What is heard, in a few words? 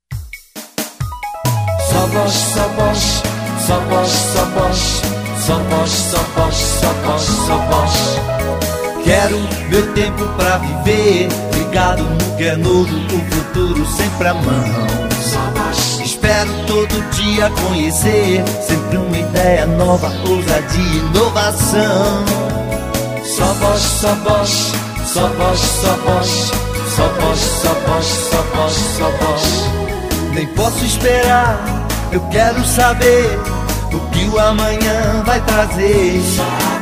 JINGLE; BOSCH; SO BOSCH